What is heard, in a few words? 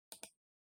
PC Mouse Far R